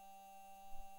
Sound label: mic, mars2020 edlcam